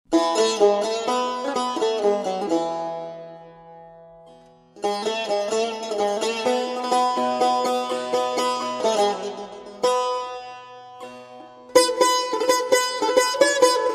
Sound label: Saz